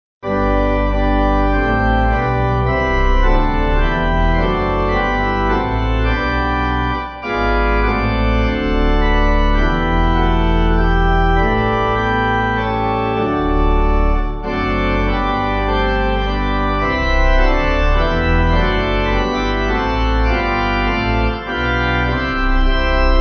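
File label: pipe organ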